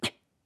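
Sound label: Climb, voice; BOTW; Breath of the Wild; Link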